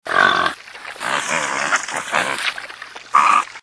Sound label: Grunt'n Ferals